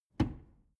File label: drawer medium close lock